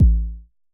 Basic Kick A1, Dirty Kicks, Vermona Kick Lancet; LookIMadeAThing Kicks

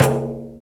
Pandeiro; Roland BK-5; Percussion

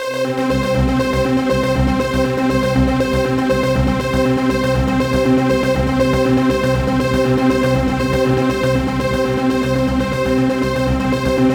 C4, SynthLine; 90 s Sample CDs; NiceBeats; SynthLine VOL-7

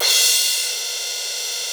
CRASH CYM; Crypton - DrumBase5000

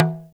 TALK DRM, Heart of Africa; Spectrasonics

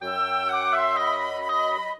Winds, Orchestral; Sonic Foundry (Sony Creative Software); Orchestral VOL-2; Modern 35

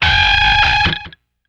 SHRIEK, guitar; Spectrasonics; Bizarre Guitar

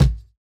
Kick, jungle breaks drum sounds

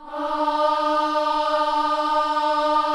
KIDS CHOIR, Gospel; Spectrasonics; Vocal Planet

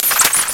FIGHT, FAIRLIGHT CMI IIX

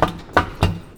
STAMP, Anime Sound FX, ROBOTS

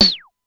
Simmons SDS-9 SN

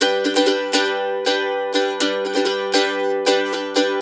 RIFF, Blues Guitar Samples; ILIO; Fretworks; CEE RIFF

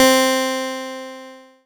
C4, Synth Line; NiceBeats

